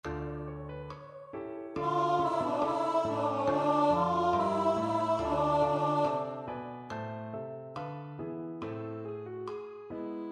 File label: Chor Tenor; Durante